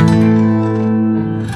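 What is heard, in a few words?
guitar strum; misc; Sonic Foundry (Sony Creative Software); Ugly Remnants VOL-2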